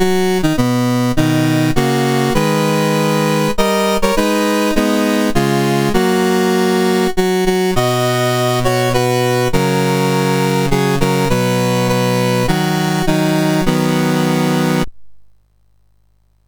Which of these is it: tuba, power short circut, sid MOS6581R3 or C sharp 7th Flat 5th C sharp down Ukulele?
sid MOS6581R3